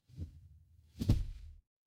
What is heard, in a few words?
impact thud